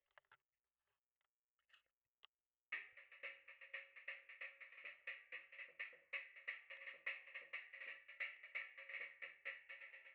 clarinet DI

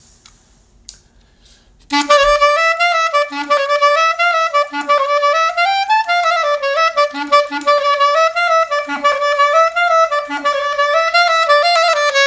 Yevanic Less Ornamented Under Clarinet

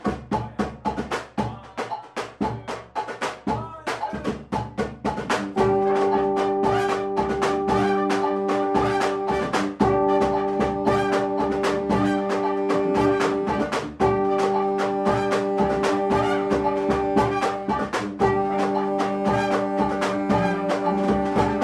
ameti cocek slow rehearsal

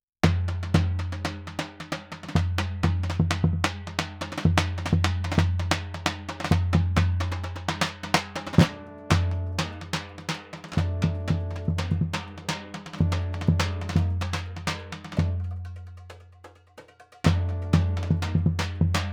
bass drum hi

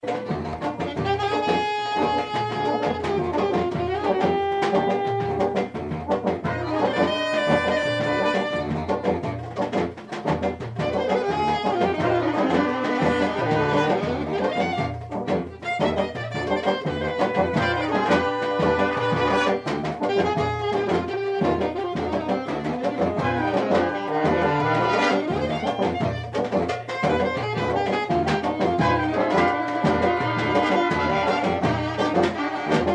solo backings rehearsal

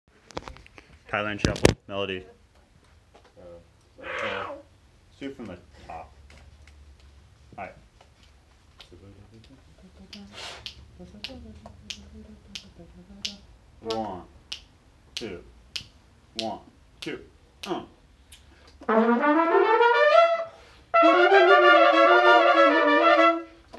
thailand shuffle melody

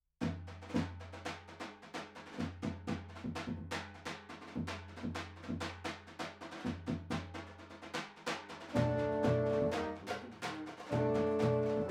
trumpets

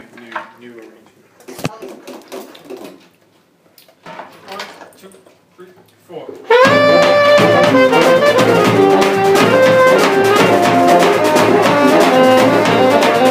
Bulgarian Wedding break

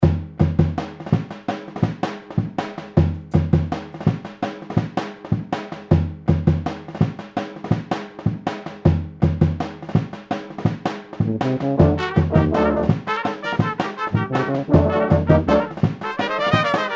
beat

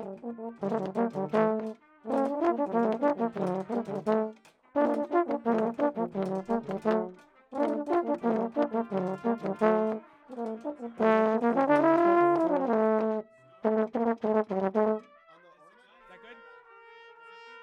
euphoniums

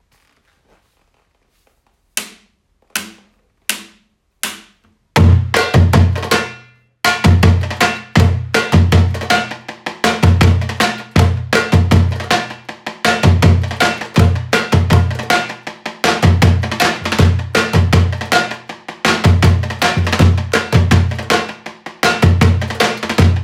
Kosavaro 80, Drum samples

